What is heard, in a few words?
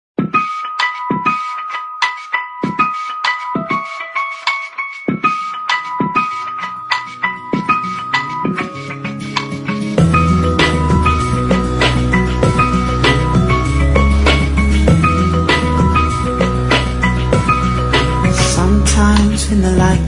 (Ao vivo)